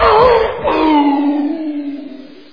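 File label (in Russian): ворчание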